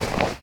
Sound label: snow footstep; minetestforfun game